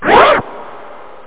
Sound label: scratch